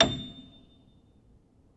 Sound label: Upright Piano; HSS OP Pack; healing soundscapes